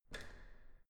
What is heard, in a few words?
entrance door handle down hard